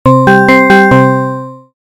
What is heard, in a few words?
tone; Clock